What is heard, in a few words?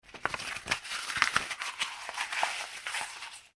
card scrunch, Card Scrunched Up; Card Or Thick Paper Being Screwed / Scrunched Up; Materials; foley; McKinney Sound